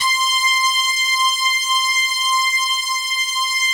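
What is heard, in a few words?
synth brass c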